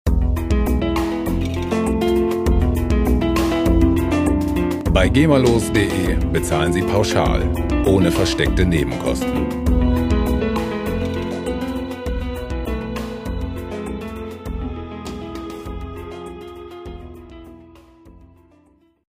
spanish sorrow

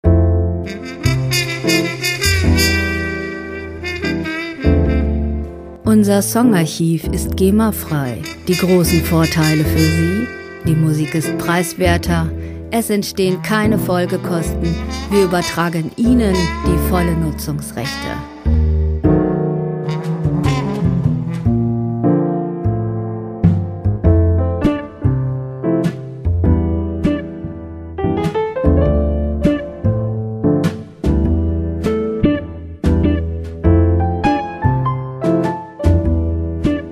just like jazz

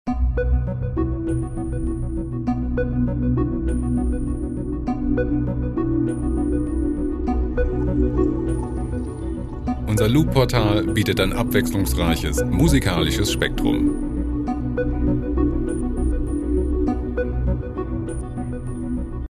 blip blop

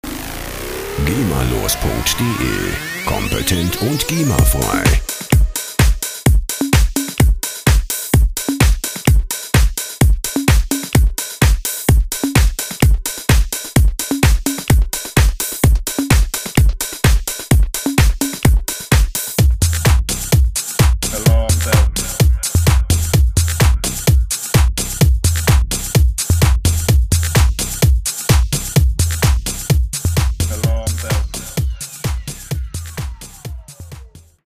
techno alarm

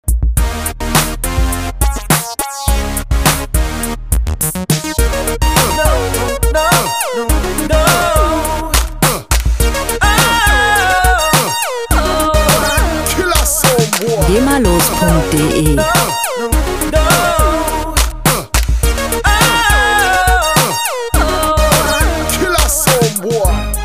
power music

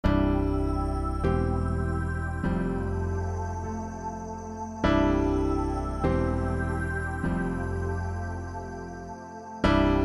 piano sky

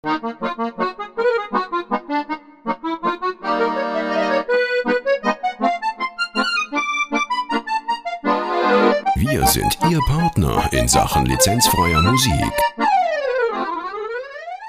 musette akkordeon

